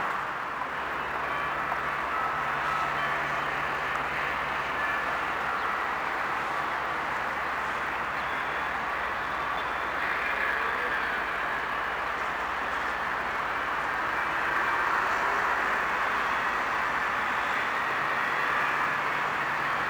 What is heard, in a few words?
Paris River, Ambience; Project Gotham Racing 2